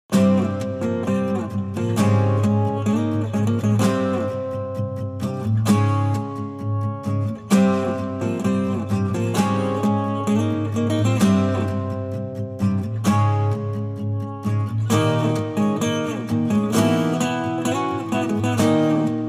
acoustic; Lower Norfair

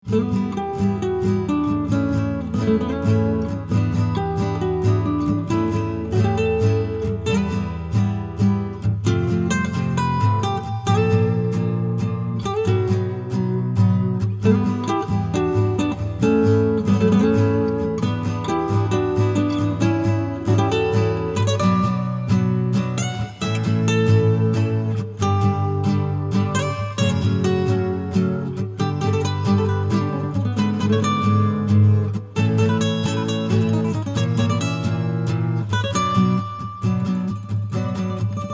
Acoustic; TP Hyrule Field

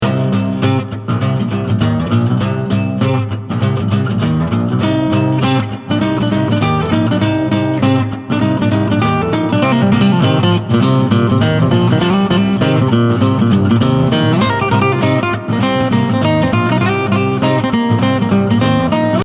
Move Piece; Pictionary NES

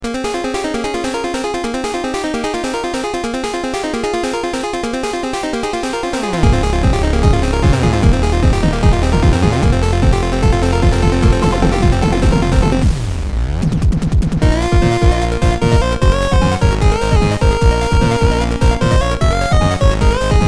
Romantic Fall (VRC6)

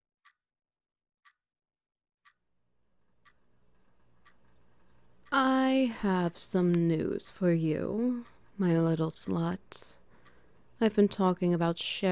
SFX Wet Noises; Getting Used to Being Used

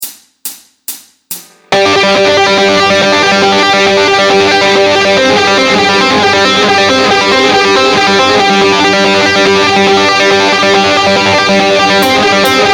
Finger Tapping Licks

